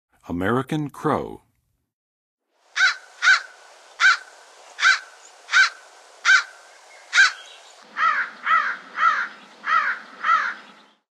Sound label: American Crow, Western USA Songbirds; North America